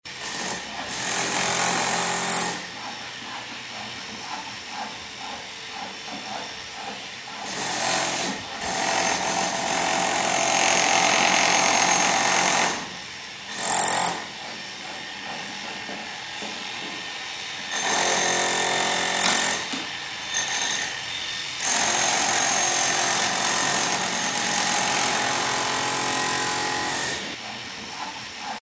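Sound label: sounds of construction and repair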